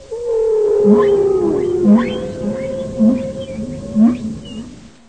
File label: whale; animals; mammals; aquatic; stamps